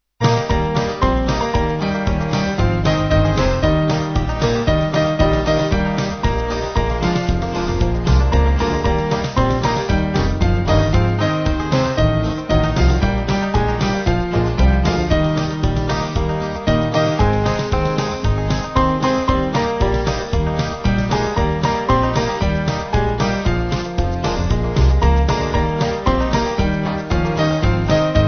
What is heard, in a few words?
Band